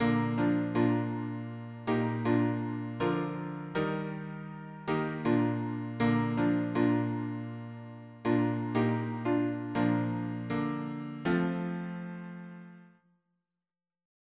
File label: harmony piano